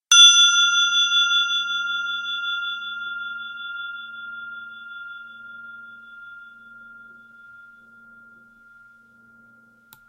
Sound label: Bell Sound